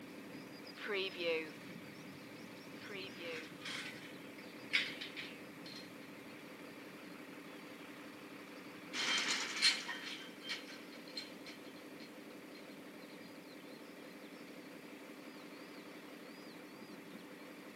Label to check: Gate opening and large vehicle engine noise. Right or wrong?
Right